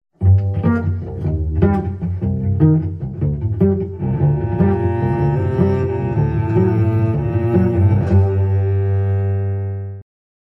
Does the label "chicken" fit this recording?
No